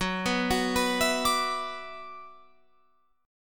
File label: F sharp 7th Suspended 4th Sharp 5th, Guitar, Open F; F#7sus4#5 arpeggiated